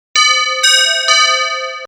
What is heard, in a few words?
Guitar